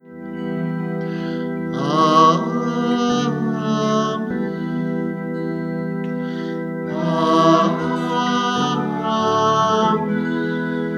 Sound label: Amen